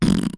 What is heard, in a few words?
fart